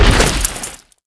drop

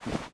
Idle Cloth

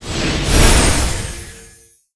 Heal

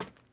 FOOT

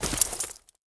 Cloth

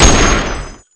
WEAPON BAZUKA

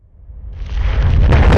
impact

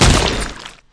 DROP

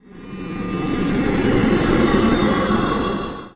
water armor cast

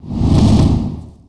Woosh

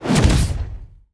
Basic Woosh

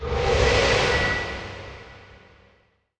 Mana Conversion HP

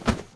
SMALL FLY

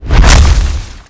Weapons Swing

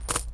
drop silver

